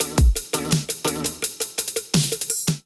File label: Diatribal Kit groove 88 bpm; MIDI Clips; Drive and Glow; Ableton; Factory Packs